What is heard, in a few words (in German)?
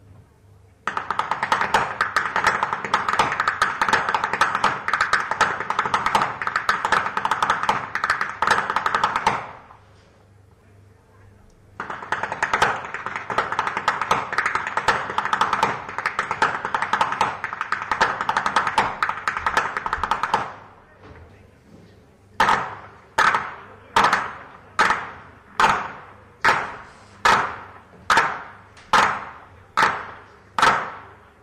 schnalzen; steinbruenning; saaldorf